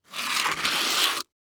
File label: Foley Footstep High Heels Scrape On Cement; Foley Footsteps